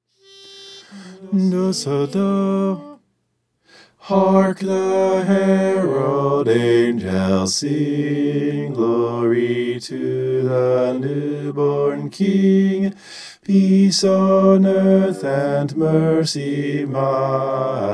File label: hark the herald bass